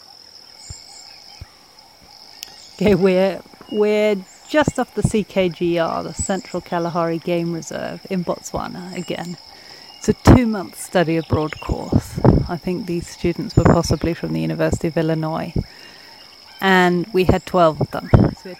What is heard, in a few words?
cows background noises; Leopard amongst the cows